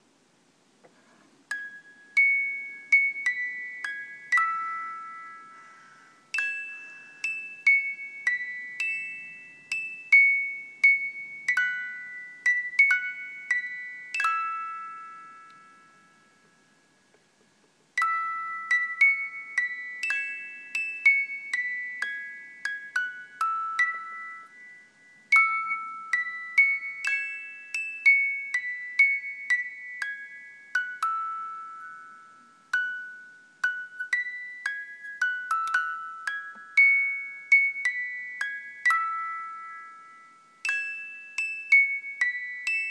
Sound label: music box